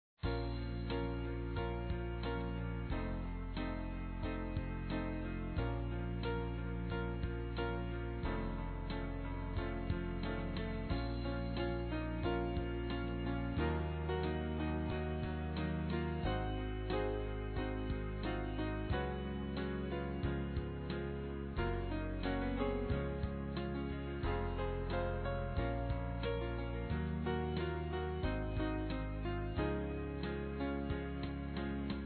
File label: Radical Dolphin (piano version)